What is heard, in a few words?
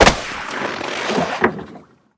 player kill grab; oilrig; Call of Duty Modern Warfare